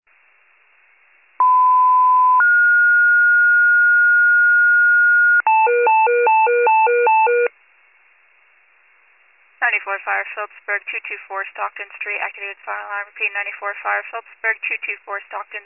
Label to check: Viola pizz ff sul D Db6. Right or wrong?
Wrong